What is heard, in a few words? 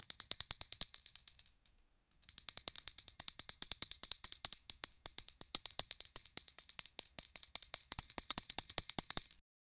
two mahjong stone hitting